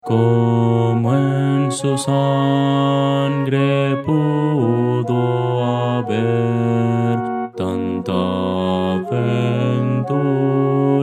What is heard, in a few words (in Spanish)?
contralto